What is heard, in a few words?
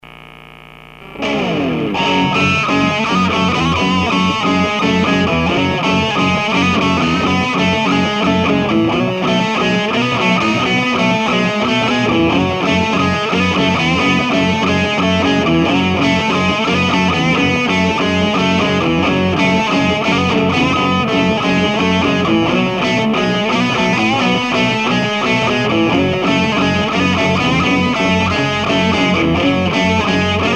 gallop